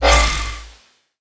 recharged

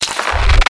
nanosuit armor switch; WarGodsShop; cstrike

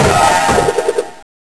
checkpoint crash; deathrun crash2; cstrike

